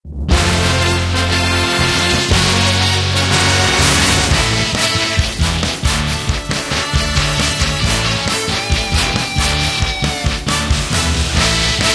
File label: Baseball Menu